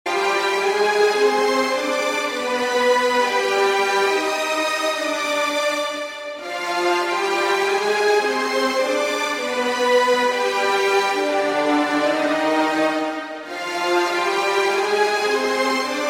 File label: end violin; ringtones; games; max payne